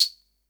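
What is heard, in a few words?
SR95 tambourine; Best Service; Dance Mega Drums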